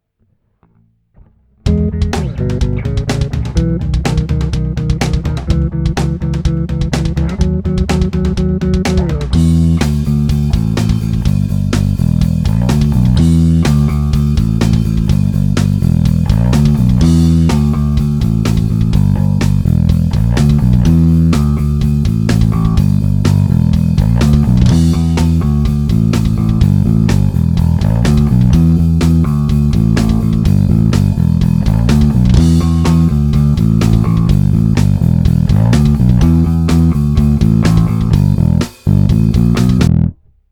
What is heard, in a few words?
all knobs center, bass; 120bpm